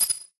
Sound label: Rocket Minefield